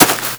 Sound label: foot grass; Call of Duty Modern Warfare